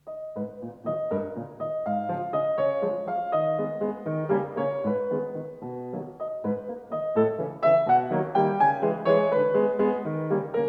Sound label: waltz